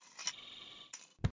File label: Handling yoyo; talking tom cat 2 android ios online gamerip 2011